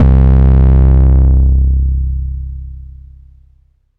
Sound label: phonk kicks oneshot 808 C; kick drum